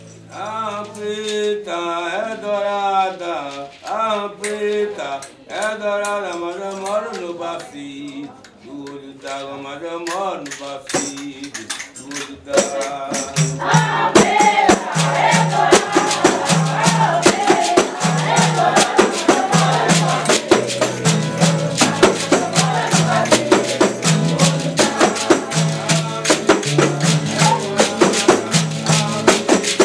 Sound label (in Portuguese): fanti baiao de princesas ra preta; Baião de Princesas é um ritual especialíssimo e único que acontece apenas uma vez ao ano